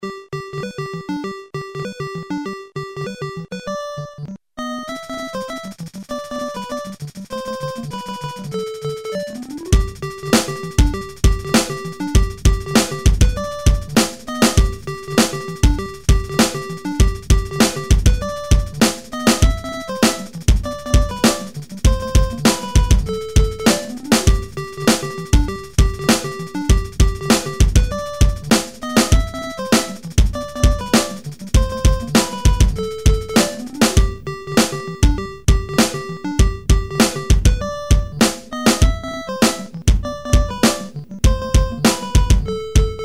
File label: techno